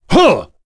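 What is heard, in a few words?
Vox Attack, English; Nicx; kings raid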